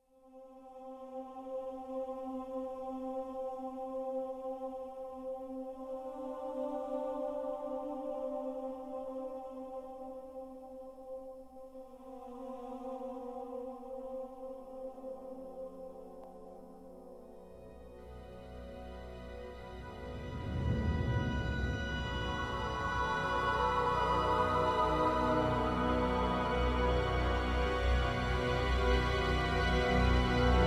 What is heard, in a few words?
Deep mysterious wonder Ambient; consortium rebalance windows gamerip 2019